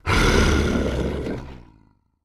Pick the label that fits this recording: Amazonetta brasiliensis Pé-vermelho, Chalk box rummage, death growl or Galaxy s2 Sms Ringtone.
death growl